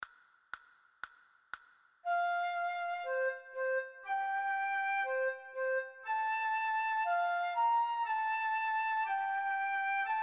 Allegro moderato, flute a bec

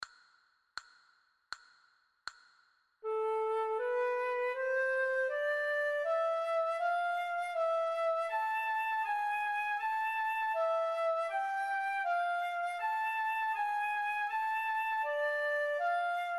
Valse lente, flute a bec

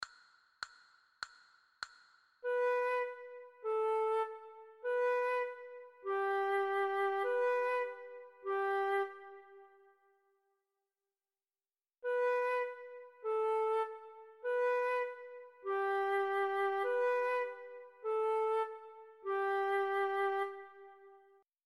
Deux petits chats flute, flute a bec; methode pour apprendre a jouer

